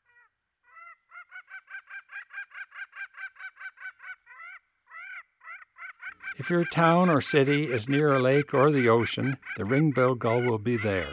Ring-Billed Gull; Eastern North America; Dan Gibson; Wildlife Identification By Sound

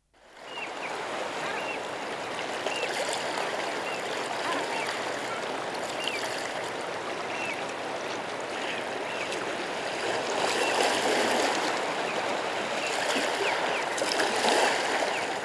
Serene Coastal Experience, Nature Sounds; Dr. Sound Effects; 210 Nature Sounds - 20 Hours of Relaxing Natural Ambiences for Meditation and Sleep

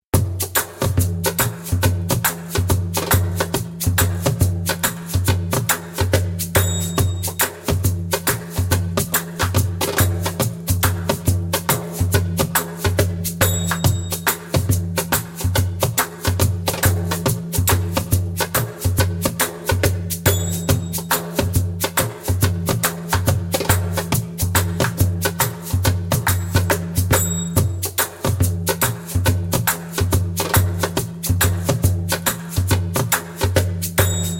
Darbuka Second Line (slow); Djembolale - Grooves and Moves

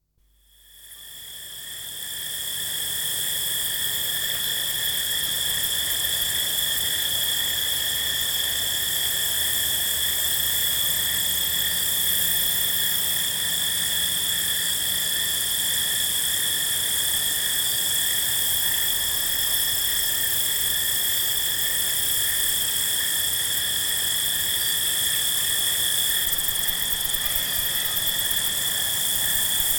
Field Crickets At Night; Dr. Sound Effects; 210 Nature Sounds - 20 Hours of Relaxing Natural Ambiences for Meditation and Sleep

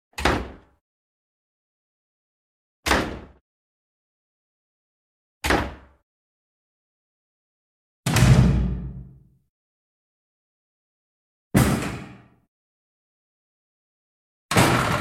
Doors Shutting; 100 sound effects

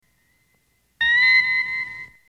Sickbay Scanner; Star Trek Original T. V. Series Sound Effects

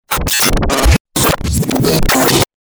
Sinapse Short, Stabs & Bursts; Best Of N2O Library

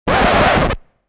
Big Scratch; DJ sounds

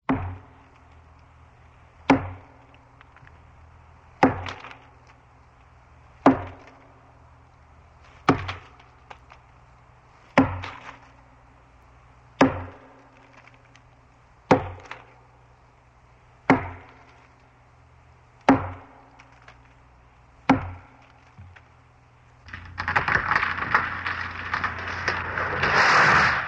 Chopping Tree, Tree Falls; SOUND EFFECTS1

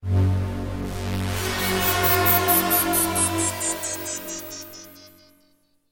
SYNTH FX

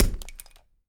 WOOD, SMASHING; 1000fx

